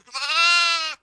bah

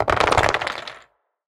Combat Blocks; portal quest android

